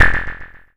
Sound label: spawn item; cdogs